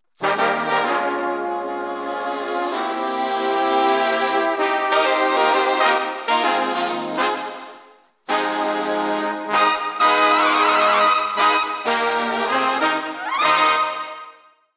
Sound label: brass sect wet variations